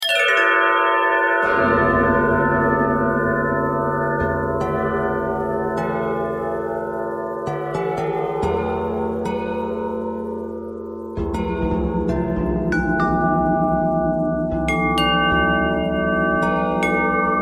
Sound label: bell bendtwist